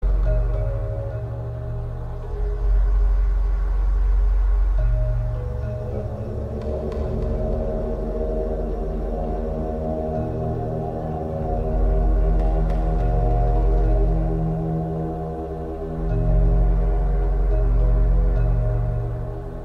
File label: Tibetan